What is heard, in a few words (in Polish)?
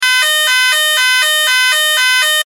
ton